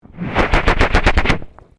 NOISE HYDROPHONE DRAGGED ON SAND, Underwater mic dragged acorss ocean floor; Nature